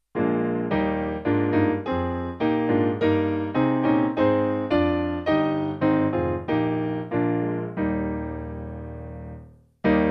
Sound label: Piano